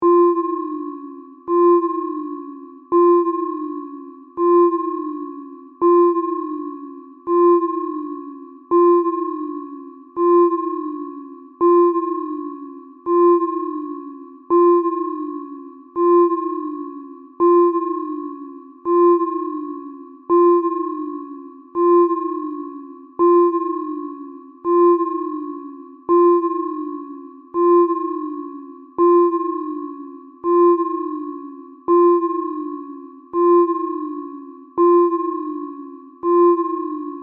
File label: Telemetry Deep Space, Scifi, Telemetry, Deep, Space, Science, Fiction; Communication Sound Effects; BlastWave